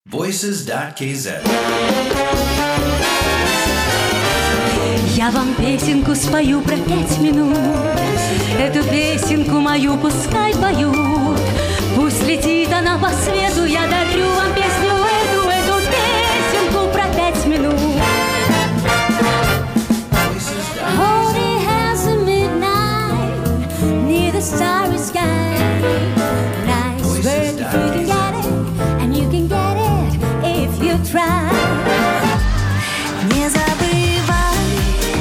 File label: vocal